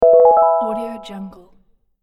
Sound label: notification